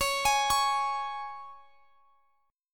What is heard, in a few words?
Db 5th Db, down, Guitar Standard; Db5 arpeggiated; JGuitar